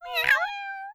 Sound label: ui meow